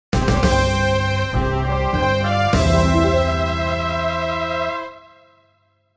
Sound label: SFX Fanfare; An NPC's Odyssey